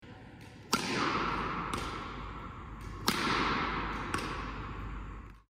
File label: badminton